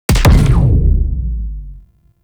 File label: Punch; Warframe; Corpus; Tenet Quanta